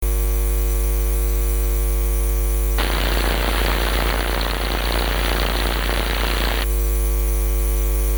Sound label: Machine Doctor Sound Sample